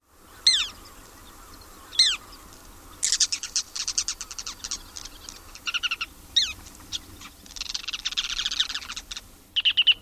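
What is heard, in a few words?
various calls of migrating birds, Ruddy Turnstone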